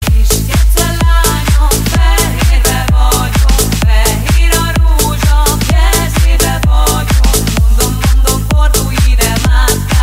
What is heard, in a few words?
remix